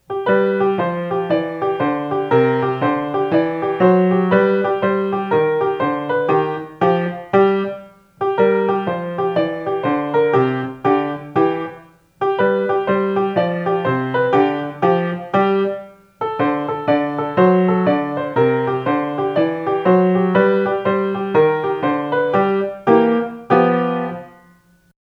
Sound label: Tweakin' The Blues, Jazz Etudes for Piano